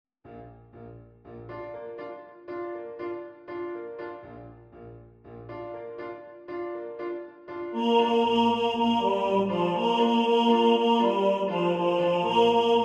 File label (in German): Tenor 2 im Vordergrund, Männerchor